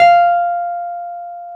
PNO, Wurly

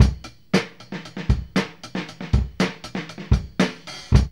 OL TICK 116, Funky Elements loops; Zero-G

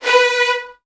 VN 16TH, 1B2; ILIO; Kirk Hunter

